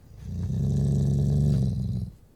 growl; casualtiesunknown

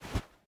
switch; casualtiesunknown

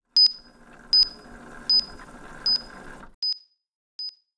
pump start; casualtiesunknown

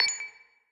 wound on; casualtiesunknown

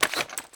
gun unload mag; casualtiesunknown

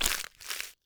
flesh rip; casualtiesunknown

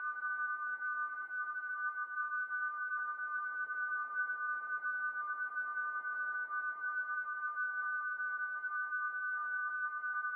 tinnitus long; casualtiesunknown

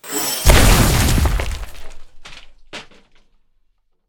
life Pod Hit; casualtiesunknown

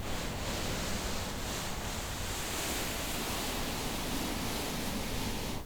slide; casualtiesunknown

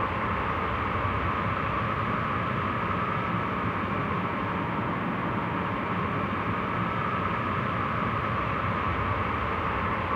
life Pod Wind; casualtiesunknown

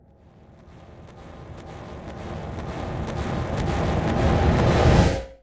blood vomit warning; casualtiesunknown

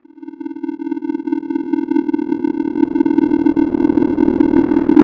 sonar charge; casualtiesunknown

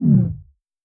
thirst down; casualtiesunknown

